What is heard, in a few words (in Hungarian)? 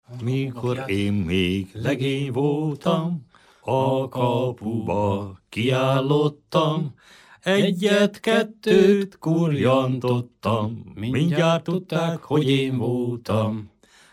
nepdal; mikor én még